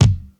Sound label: 90s pop folk kick sample g sharp key